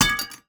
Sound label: glass hit